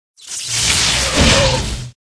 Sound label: mech zard attack, monster